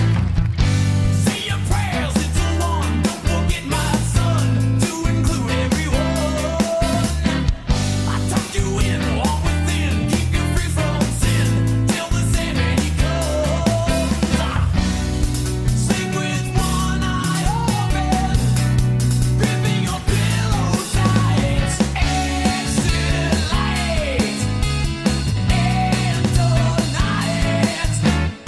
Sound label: Jazz